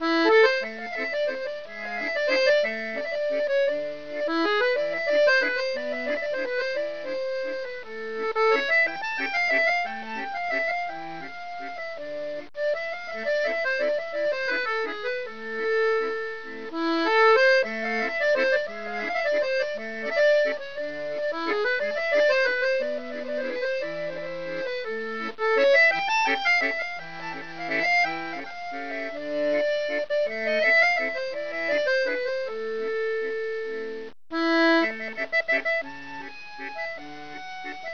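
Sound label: accordion; cdromtoday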